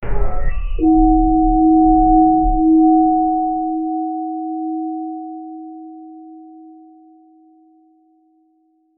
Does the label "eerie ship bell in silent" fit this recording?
Yes